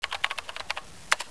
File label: KEYBOARD; Tech Gian April 1998